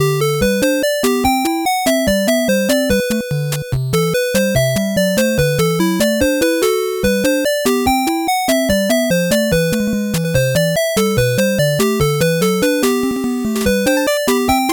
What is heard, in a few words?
8bit bgm fast